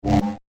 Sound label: Short Laser Whoosh; Whoosh Sound Effects